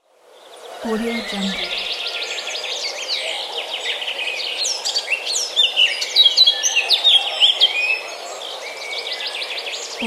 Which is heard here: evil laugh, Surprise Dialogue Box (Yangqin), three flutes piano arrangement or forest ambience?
forest ambience